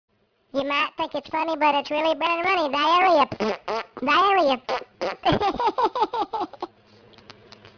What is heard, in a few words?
Chipmunk Diarrhea